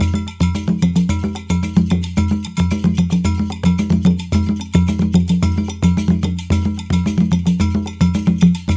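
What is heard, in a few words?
110 bpm, Junkanoo; Spectrasonics - Supreme Beats - World Dance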